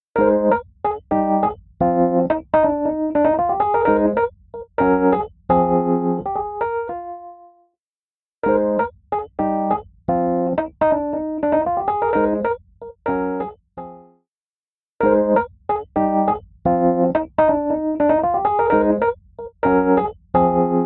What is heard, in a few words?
Tonal Tremolo; Preset: Tonal Tremolo; Physion Mk II